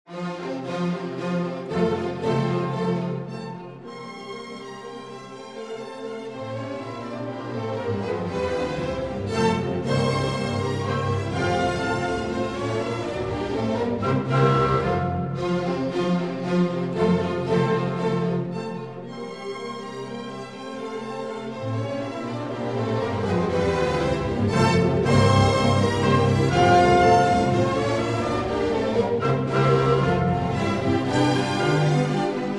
Symphony No. 8 in F Major, Op. 93 III. Tempo di menuetto